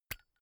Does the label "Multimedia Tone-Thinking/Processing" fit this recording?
No